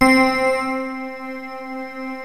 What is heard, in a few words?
METAL KEYS, ENSONIQ VFX; Rubber Chicken Software Sample Bank